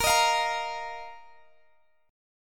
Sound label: A sharp Suspended 4th Guitar Open G